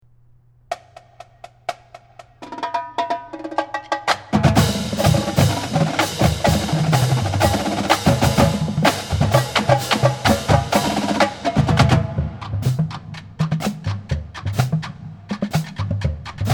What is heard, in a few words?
Drumline; Blue Fumo Drumline